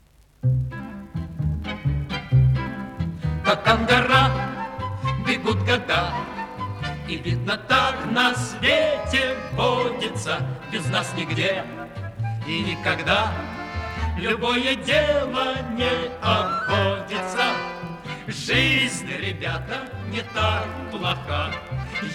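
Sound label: vokalnyiy kvartet, male vocal quartet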